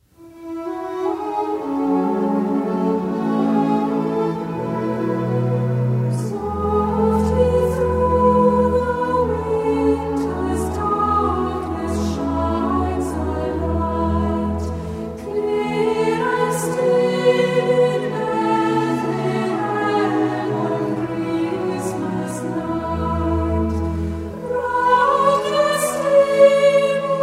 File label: Christmas Night (French Carol); Traditional (French)